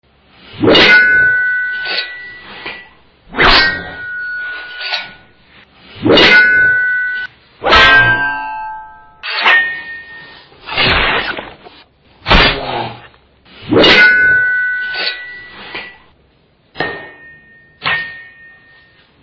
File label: sword